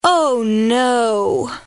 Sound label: Error